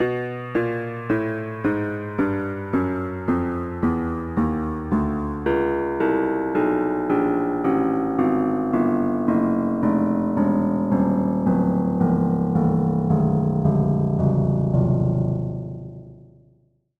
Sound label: Dance Waltz; knigge spielend zum guten benehmen; nds